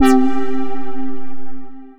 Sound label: Synth Echo Fifth; Synth Lead; Ableton; Skitter and Step